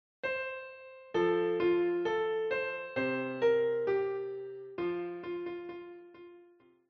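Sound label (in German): Auf einem Baum ein Kuckuck sass, Klavier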